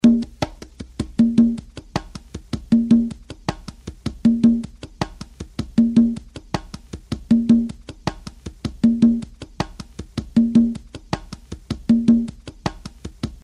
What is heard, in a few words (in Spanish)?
conga bateo; CONGA PATRÓN BATEO